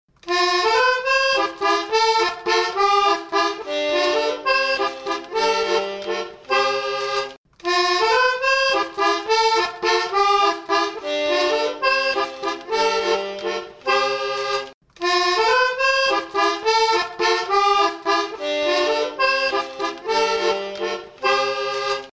Harmonious

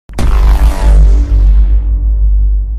notification

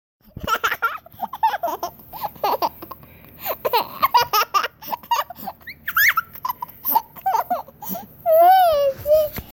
bug giggle

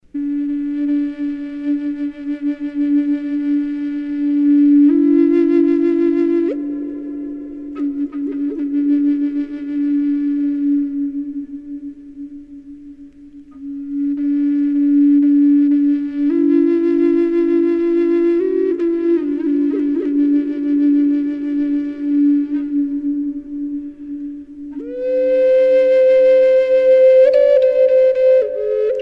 Native American Flu

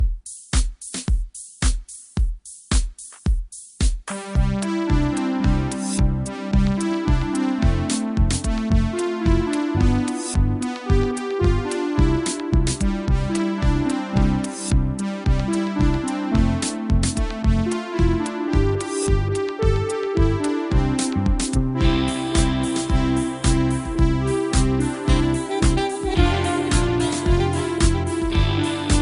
Christmas mix; iPhone

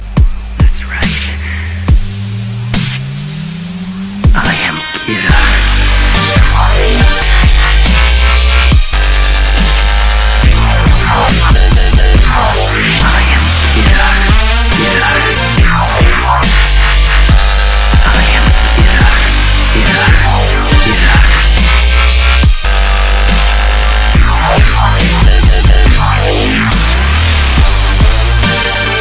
dub step; electronic

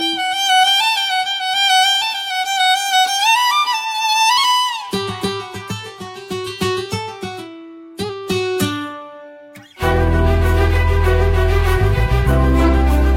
volin malayalm

